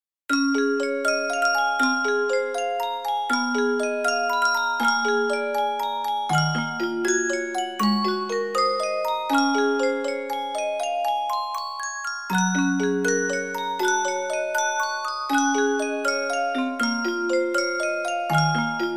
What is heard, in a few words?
jingle bells, xmas